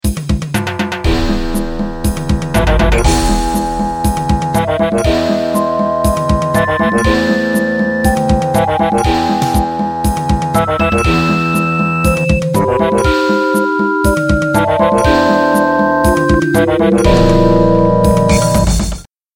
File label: ringtone